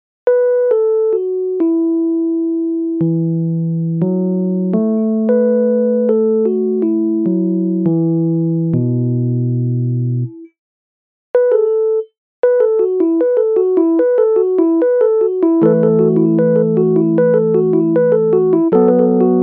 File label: Esus2 again